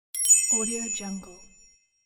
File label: twinkle star